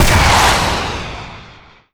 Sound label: airstrike fire; tf2